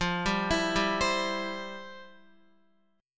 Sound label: F Major 7th Suspended 2nd Guitar; arpeggiated; chordshape; x,8,7,9,7,8